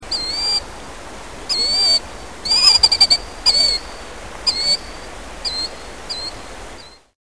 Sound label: kildeer